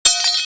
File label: large knife, clattering